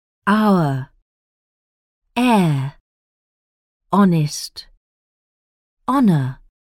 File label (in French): son techno